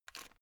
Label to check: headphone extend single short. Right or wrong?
Right